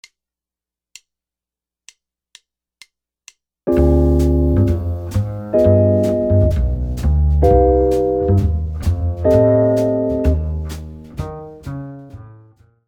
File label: jazz guitar comping